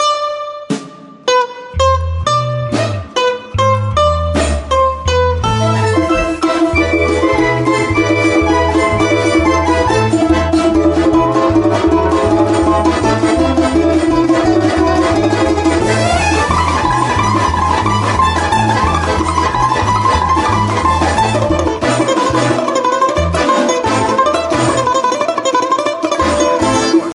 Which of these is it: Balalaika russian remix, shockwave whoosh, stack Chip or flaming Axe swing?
Balalaika russian remix